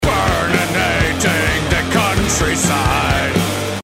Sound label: song metal country; trogdor